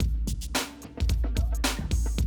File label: 110, Classic Drum Loops